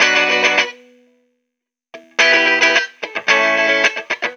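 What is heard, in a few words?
guitar, Roots Funk; USB Soundscan vol.41; AKAI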